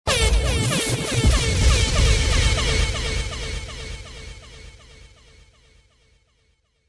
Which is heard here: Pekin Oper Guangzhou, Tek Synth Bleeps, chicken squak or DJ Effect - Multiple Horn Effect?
DJ Effect - Multiple Horn Effect